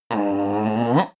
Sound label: male grumble strain start; menschen; koerpergeraeusche; soundpool